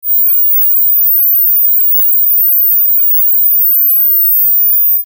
Pitch Band

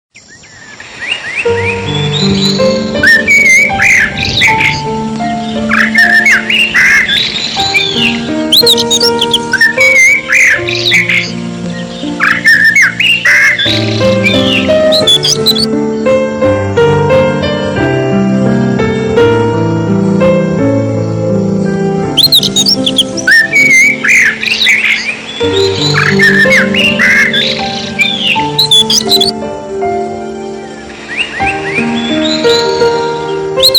Spring and bird

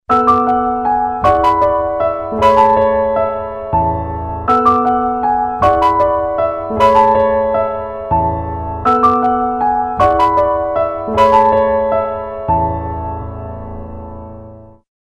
Nokia Tune, ringtones

